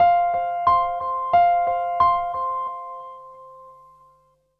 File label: Quartz Piano; Zero-G Smoke; Keyboard